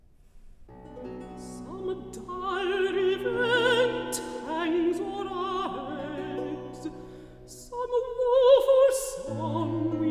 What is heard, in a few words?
Recitative; Act I; Storge; Some dire event; Klassika; Handel Masterworks